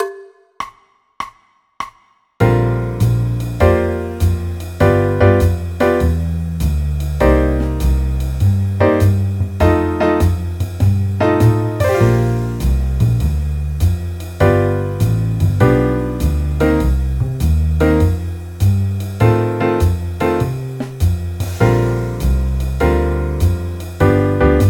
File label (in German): bb dur akkordverbindungen